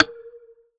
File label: E-Perc Strike Grounded, Electronic Percussion; Drums; Ableton Live 12 Lite; Core Library